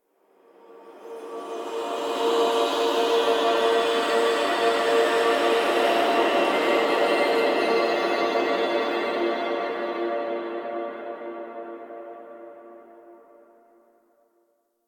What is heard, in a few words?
Shrine Stinger; Warframe; Ambience; Grineer Forest; Forest Titania Shrine